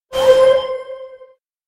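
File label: resurrect; gw2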